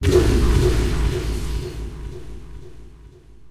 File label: cascade; Polaris